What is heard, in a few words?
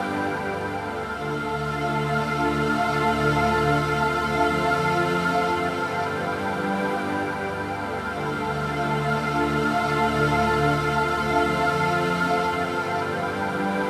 Pad DRY 138BPM, Dminor; Melody & Synth; Vengeance Samples; Nightfly Kit